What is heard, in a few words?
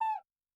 Cuica; Yamaha DTXpress-II; Perc